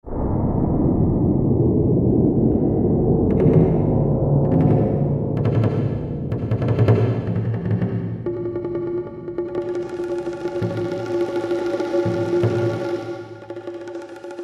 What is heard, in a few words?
PERCUSSION - CHINESE PERCUSSION; Spectrasonics Heart Of Asia